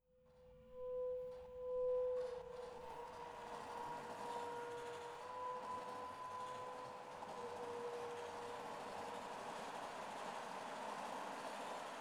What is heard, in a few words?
MIDI; saxofono; Aion; Geminiani